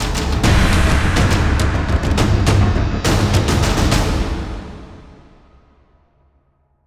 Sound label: Stinger Fill